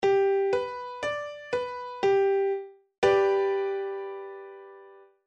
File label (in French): degre V